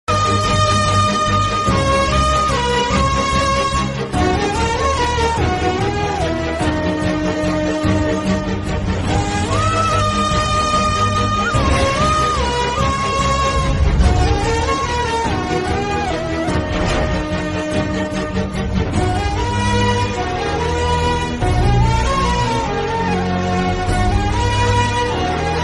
flute ringtone flute; romeo juliet

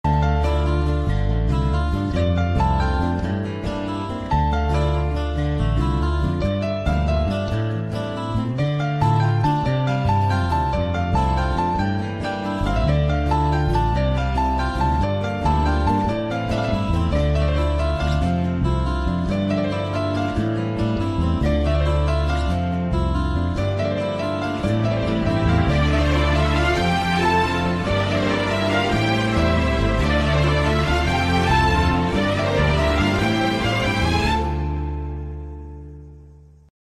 love bgm love ringtone; raghuvaran btech

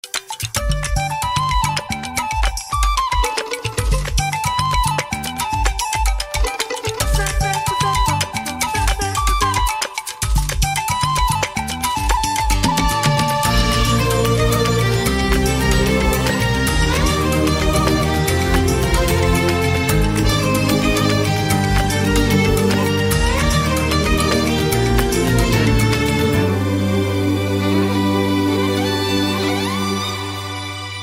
love bgm ringtone; ante sundaraniki

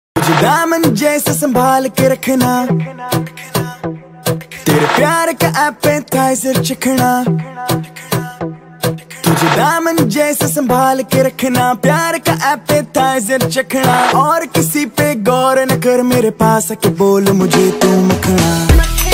love music hindi ringtone

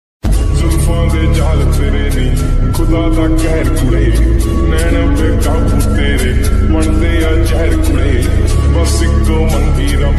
hindi ringtone

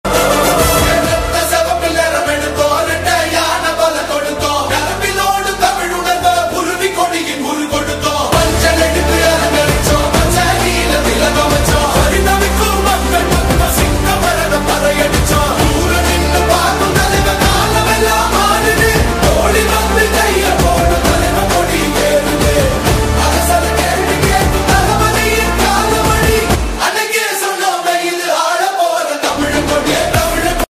flag song ringtone; tvk; goosebump moment

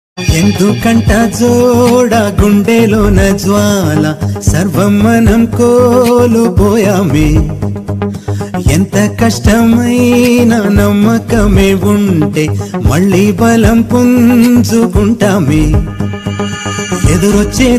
song; surya

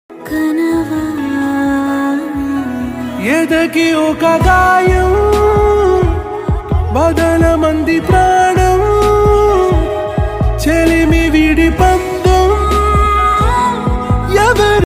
song

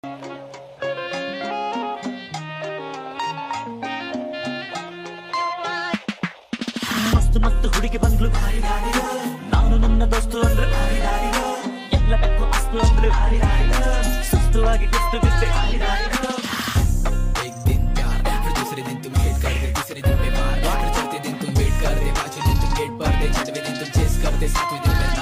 ringtone kannada ringtones

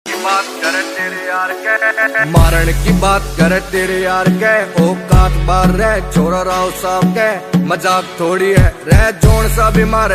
Haryanvi songs

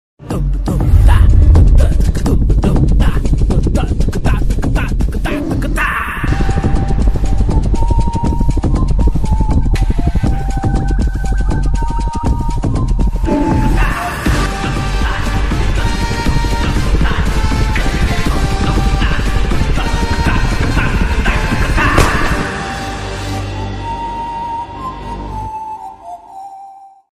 stylish bgm

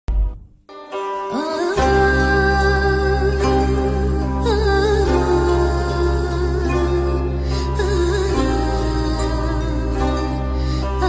sad ringtone turkish bgm ringtone; kurulus osman

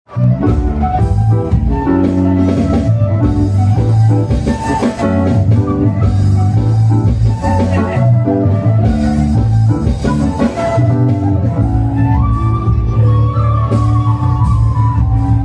flute; duet; live concert

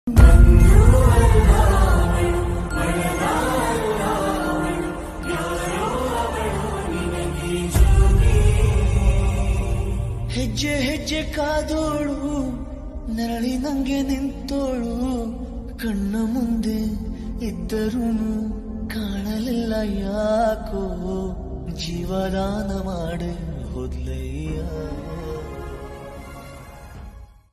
feeling bgm ringtone, kannada; jogi

